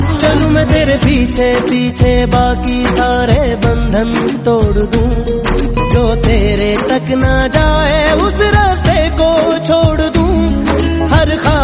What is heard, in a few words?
best romantic ringtone